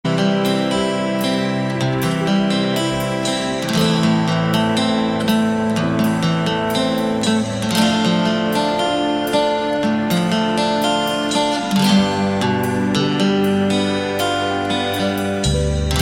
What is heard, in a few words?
lovely guitar tone